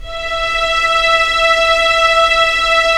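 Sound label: Strings Violins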